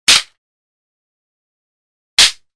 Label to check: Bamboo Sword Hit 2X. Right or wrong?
Right